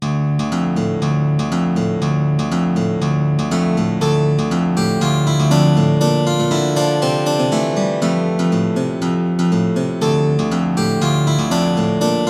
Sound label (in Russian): gitara, sintezator